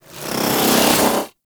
Bow Draw; Weapons; Warframe; TheNewWar; Omicrus

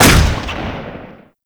Rifle 2D; Warframe; Weapons; Bolto Rifle

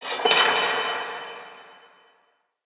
Chain Rattle Distant; Ambience; Crypt; Champion Crypt

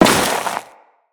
Crewman Step Ice, Footsteps; Corpus

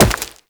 Wall Jump Rock; Warframe; Tenno; Wall Run

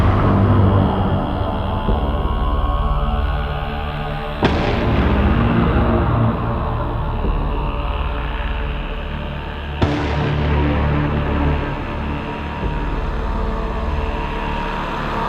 Ziggurat Cue; Entrati; TennoCon 2023; Cinematics

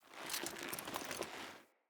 Foley Move Short; Friendly; Deora

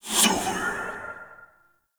Grimoire Fire Whispers; Warframe; Tenno

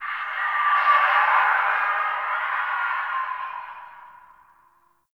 Distant Infested Screams Winter A; Warframe; Ambience; NineteenNinetyNine

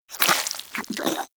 Armadillo Tongue Move; Warframe; Characters; Hunting Critters

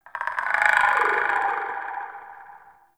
Ext Chatter Scream; Misc Outdoor

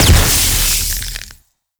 Wave Ability Ice Proj By Explosion; Enemies; Abilities; Warframe; Duviri; Dax Knight

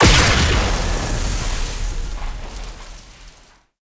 Strike Explosion; Warframe; Trapper

